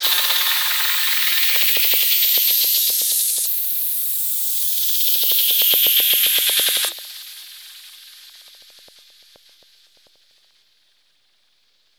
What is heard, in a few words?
Noise FX; Singomakers; Infected Psytrance